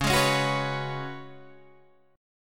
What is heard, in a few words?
C sharp 7th Suspended 4th C sharp, Guitar Open D